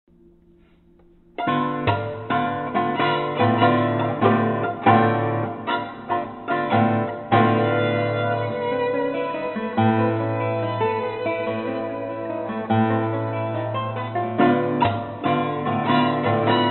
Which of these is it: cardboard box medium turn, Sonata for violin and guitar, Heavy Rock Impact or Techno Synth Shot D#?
Sonata for violin and guitar